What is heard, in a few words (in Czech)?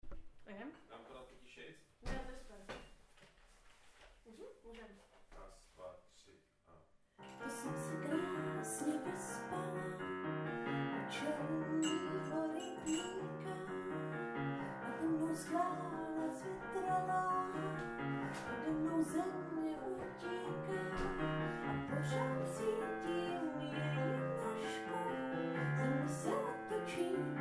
jen klavir zpev